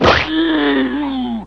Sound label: zombie hit hard; Hellboy